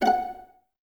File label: Violas F 5 Pizz; Miroslav Vitous; String Ensembles